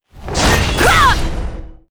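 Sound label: attack, monster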